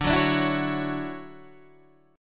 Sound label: D-Major 9th D down Guitar DADGAD